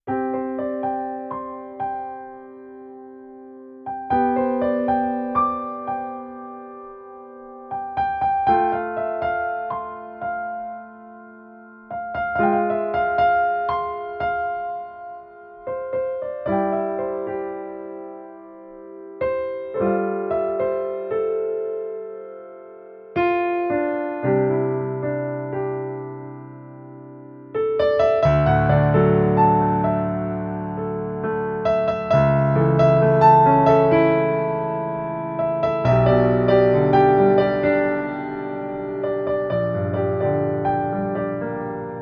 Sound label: CSP-170 CFX; yamaha